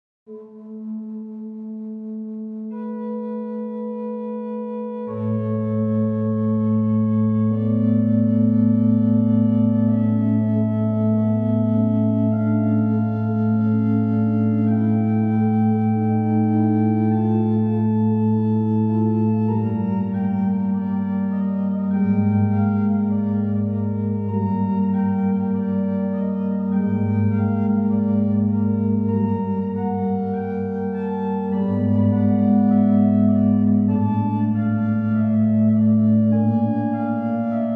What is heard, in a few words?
Messe Noel, OrganMusic; Fessy & Mine; Souvigny